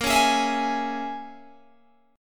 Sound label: A sharp Minor 7th Flat 5th, down, Guitar, Open G